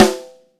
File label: reverb steel snare drum sound c key